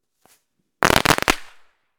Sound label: Firecrackers